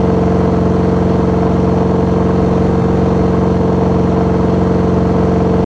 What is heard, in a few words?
Troop Truck Engine Loop; Prison Architect